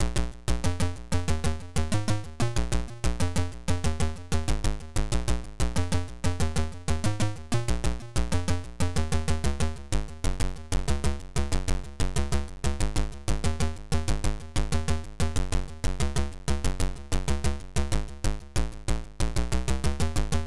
bass theme

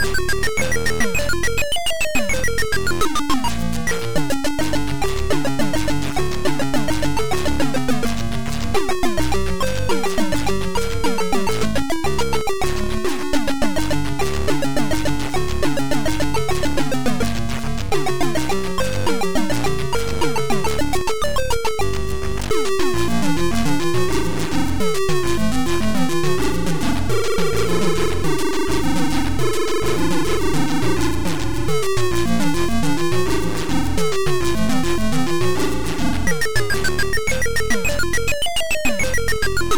SAMBA

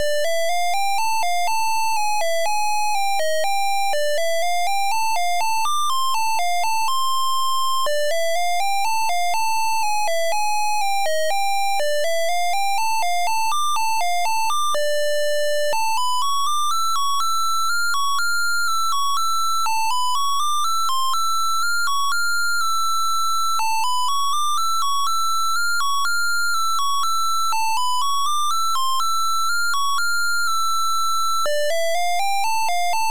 MELODY

